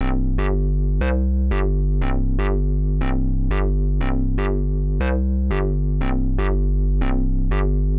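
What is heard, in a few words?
square bass B C, Nu Jazz House, Bass Loops, 120 bpm; PowerFX - Nu Jazz House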